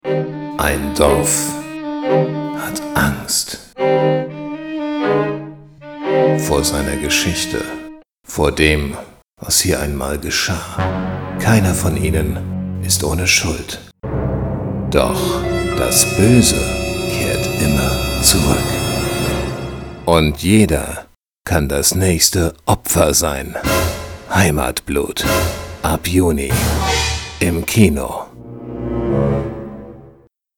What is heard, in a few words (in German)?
Movietrailer